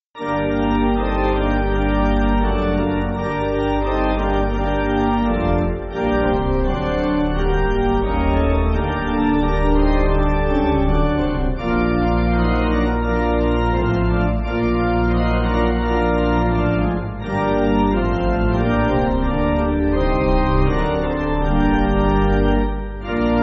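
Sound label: Pipe Organ, Backing Files for Churches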